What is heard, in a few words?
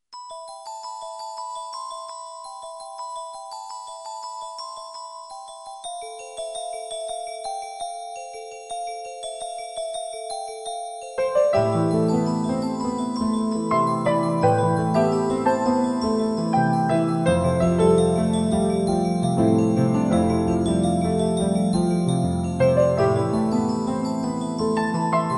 rainy town